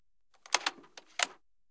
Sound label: 12mm m2 mech, guns tanks